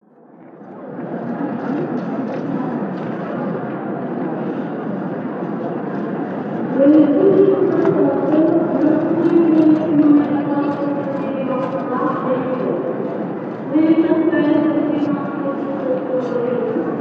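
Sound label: noise in railway station building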